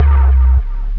HIT, Hits & Brass

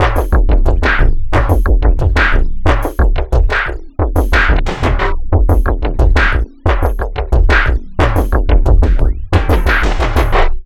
steam 090bpm, downbeat dance grooves; Zero-G

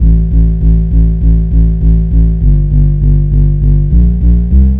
Bassline; Drums & Drones

